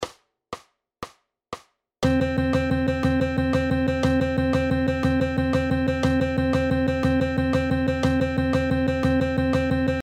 Achtel Triolen; 3 triplets are played within one beat, so a total of 4 sets of 3 triplets per measure

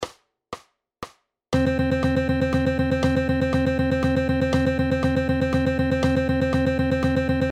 Sechzehntel Noten 34, Sixteenth Notes in 3/4 Time

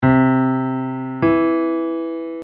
Intervalle, eartraining for guitarists

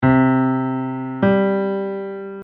Intervalle, eartraining for guitarists

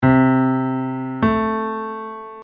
Intervalle, eartraining for guitarists

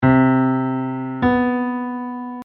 Intervalle, eartraining for guitarists

